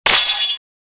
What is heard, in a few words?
sound effect of, armor